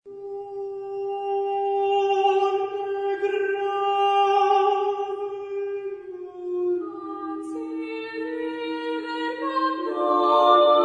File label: Madrigals; Monteverdi; Non m'e grave'l morire; chorus